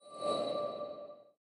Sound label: symbol Light Up; templeofnudges